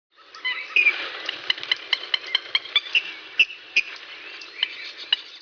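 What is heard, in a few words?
sultanka voice, purple swamphen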